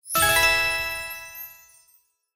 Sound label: win prize; SLOTS; Cyber Angel